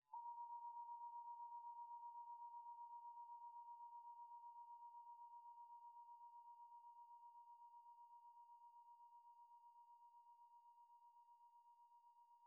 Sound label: singing bowl; peak band P04; misy dźwiękowe